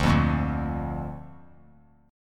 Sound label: D Minor Sharp 5th D down; Dm#5 strummed; JGuitar